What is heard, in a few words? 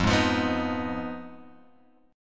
F Minor 7th Flat 5th, Guitar, Open G